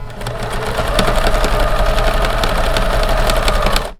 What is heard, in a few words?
uniform printer, machines